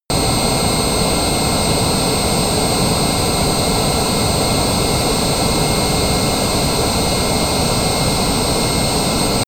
military jet eurofighter typhoon turbine full power direct; flugzeuge jets; verkehr transport